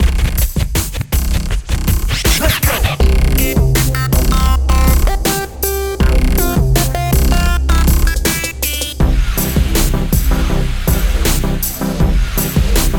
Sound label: Dance Time 80bpm; crossfire online